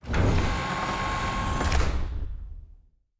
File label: Door, Sci-Fi Sounds